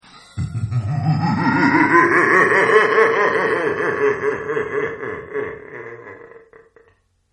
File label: Laugh Evil Wet; Laugh Evil Very Wet; Evil Laughs; Horror; Partners In Rhyme